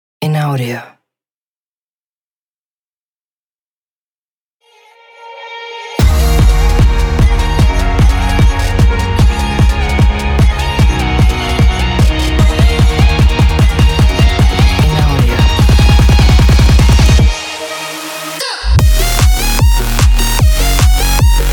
Summer Energetic Dance; Oddvision Media; Infraction Music

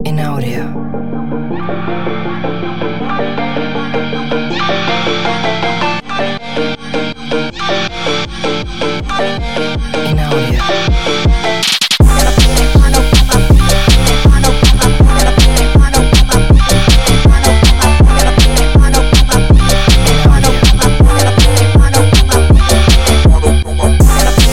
Manga Groove; MokkaMusic

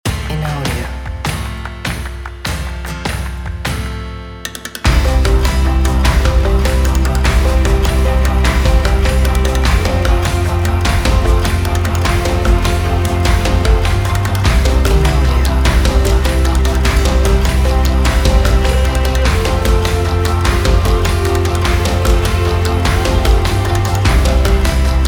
Travel Banjo; MokkaMusic

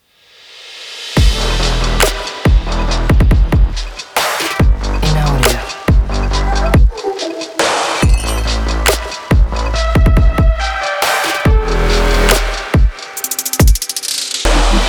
bionic logo

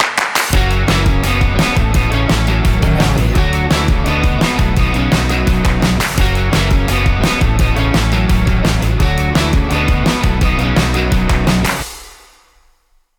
drive logo

